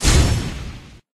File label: slash; Minecraft; Votive Content